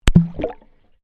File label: Water Microphone Dunk Shallow Submerged; Submerged splash sounds; Splashes; Sound Effects:Objects